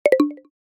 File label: Notification Accept; Sound Ex Machina